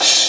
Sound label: crash